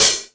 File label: High Hat, Drum Machines E-MU Pro-Cussion